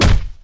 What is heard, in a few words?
punch; hotline miami